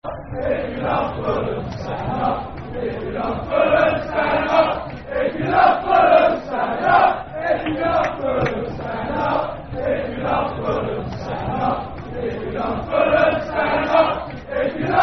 Stand Up If You Love Fulham, Football Chant (Soccer Songs)